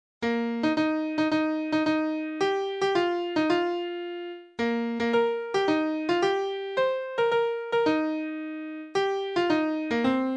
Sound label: piano